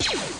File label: blaster